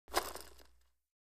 Roller Blade Spin; roller blading

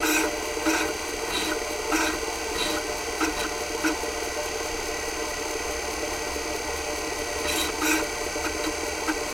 Apple II Wiping Medi, computers

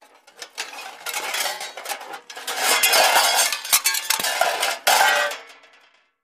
Junk Search Through J, junk crashes; Junk Search Through Junk Pile

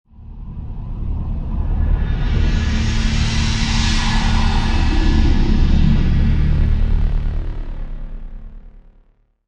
Music Trans Dark Pad

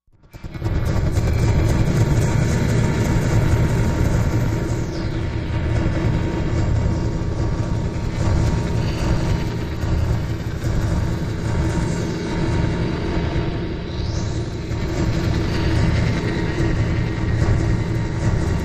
Transfer Station Mach, sci-fi ambience

